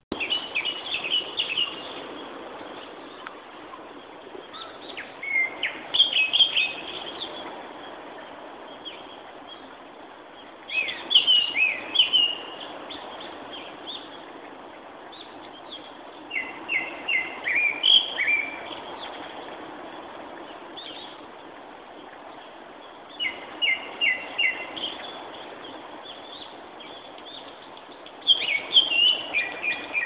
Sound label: Turdus cardis